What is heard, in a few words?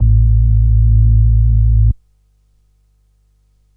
Smooth Bass, A1